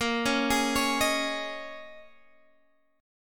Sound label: Bb Minor 11th Bb down Guitar Standard; arpeggiated; JGuitar